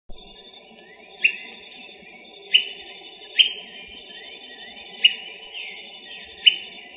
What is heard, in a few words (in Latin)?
Myiarchus tyrannulus; PN Chaco